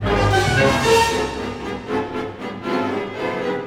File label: Orchestral Loops